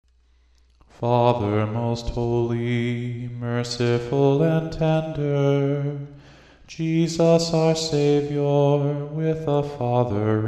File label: Hymn Father Most Holy